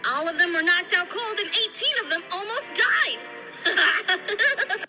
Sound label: almost died laughs